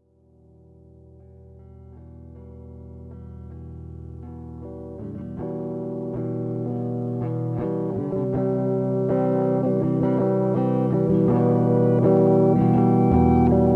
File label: melting guitar